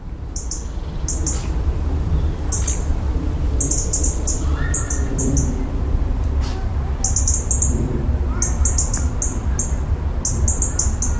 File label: bracknell robin at sound site south hill park